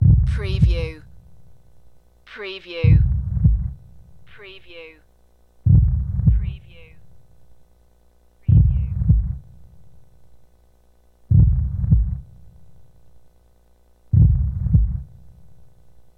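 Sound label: Heartbeat Deep Slow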